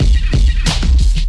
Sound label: Drum loops, Boombap